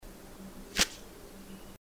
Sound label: wing